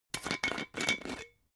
glass bottle massive open

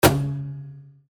fx click

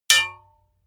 hammer hit metal

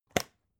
folder lever arch open

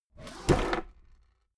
desk drawer open heavy

